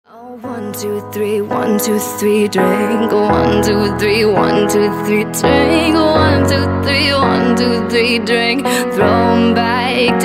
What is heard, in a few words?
cover, piano version